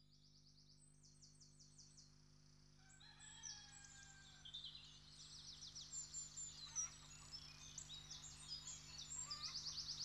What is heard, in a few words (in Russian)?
Zvuki prirody, Monastyrskij sad